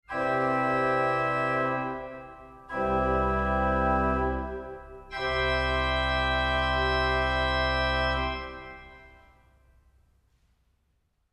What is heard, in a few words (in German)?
Cornett 4fach